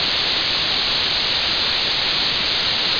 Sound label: static